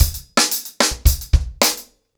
110BPM, ACOUSTIC DRUMS

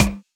Percussions

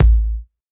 Kick big ambient; Sonic Foundry (Sony Creative Software); Ugly Remnants VOL-2; Beatstuff

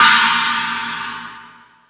More Cymbals, E-MU Pro-Cussion

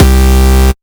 Basses Root C

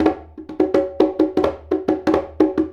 Conga Fill; Essential Percussion

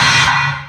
VERB HH, Drums; Distored Reality 1&2

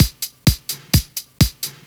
Straight Loop 128 BPM, House

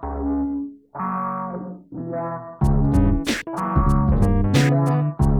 94BPM, Hip Hop; InVision Interactive; 40 Oz Phat Slammin

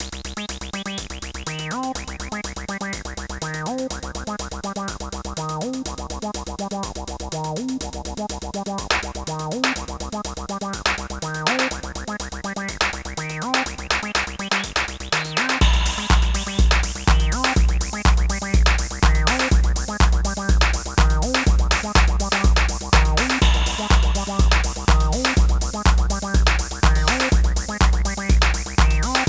Energy - 123 bpm, Old Skool House; Big Fish Audio; OSH Construction Kits

